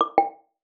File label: logout; voice-cat